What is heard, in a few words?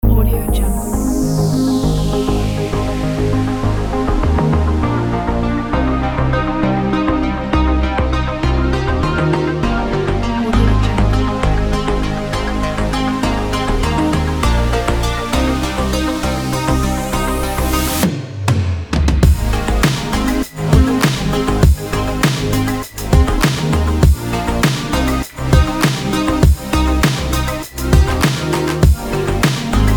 upbeat new wave pop